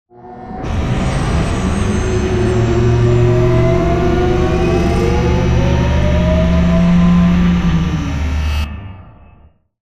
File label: Giant Floor Panel Exit On Track Servo; Boomtown; Sideways Town; Titanfall 2